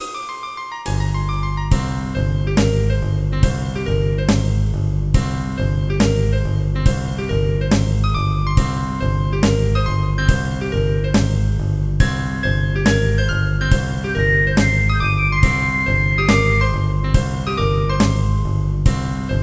sad theme

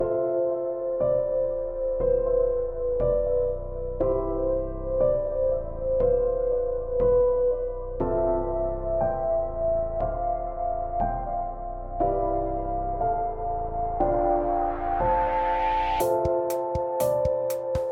motivational music

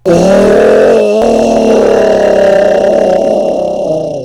car acceleration

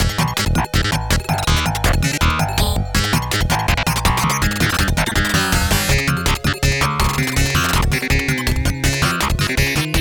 chaos factory short loop

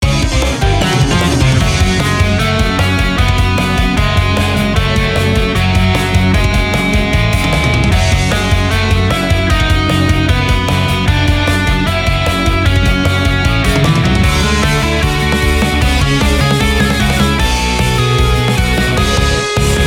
jrpg battle

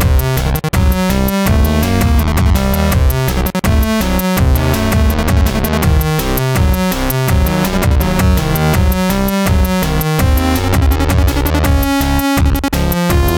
battle

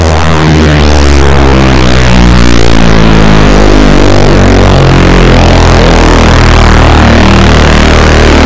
heli

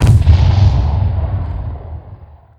Double Explosion

